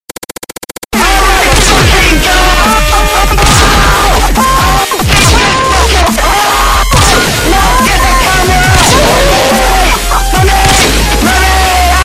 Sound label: follower alert